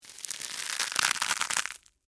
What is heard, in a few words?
Crunch Cereal Slow, Honey, Oats; Texture; BlastWave